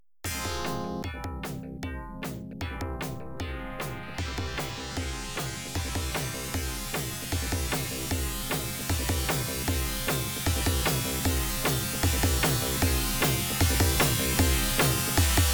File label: Catwalk Timer, pinball